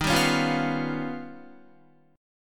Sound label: D sharp Augmented 9th D sharp Guitar; strummed; 10,10,13,10,11,13; JGuitar